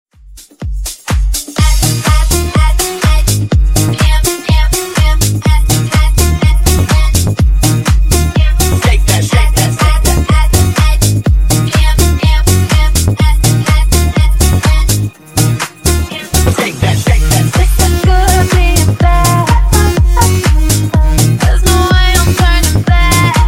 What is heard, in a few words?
Disko Funk 124Bpm